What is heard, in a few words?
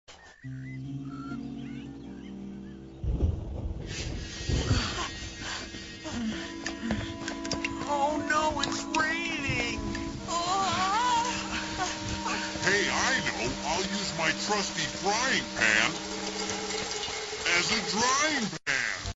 frying pan